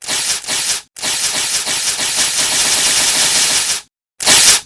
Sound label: breakdown